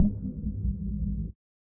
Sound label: scifi power down